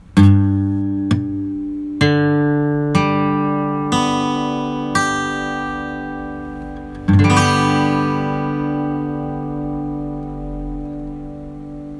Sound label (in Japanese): 5弦省略 G サウンド